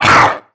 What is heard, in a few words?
snap at player, headcrab reviver; creatures; vox